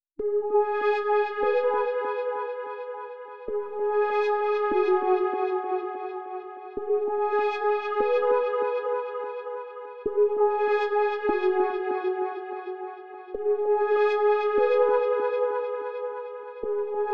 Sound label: 146 BPM C# Min Pad; Loop Stems; Cymatics; Message